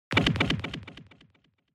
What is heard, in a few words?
Fracture Delay; 骨折; ディレイ